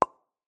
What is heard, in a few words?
pop; CrossyRoad